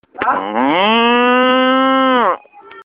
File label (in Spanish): Vaca